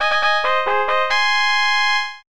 Fanfare

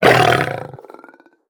SFX Cat Start Battle; mighty party 2017 gamerip pc ios android

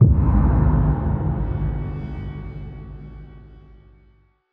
Stingers Sting Pain; Hand of Fate 2

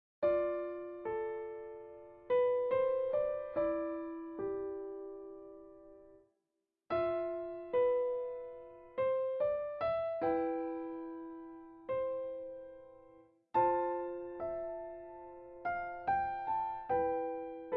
basic+pedal full; Sky Children of the Light gamerip

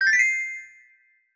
Correct; Banjo-Kazooie

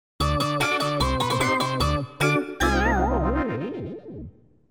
Battle Mode Loss!; bomberman world

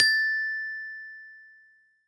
Menu Increase Coins

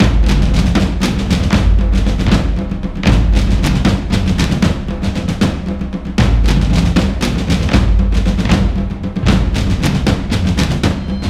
Drums; For Honor

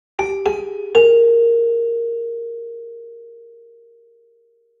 Marimba

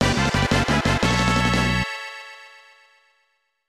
Level Up; dragon knight 4; snes

